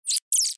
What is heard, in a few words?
insect chirp vocalization; sound effects one